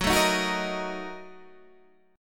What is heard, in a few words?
Bb Major 7th Suspended 4th Sharp 5th, Gb, chord